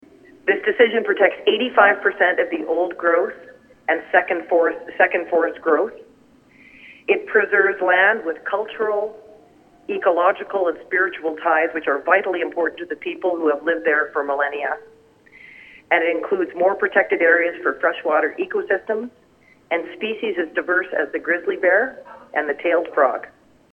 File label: rainforest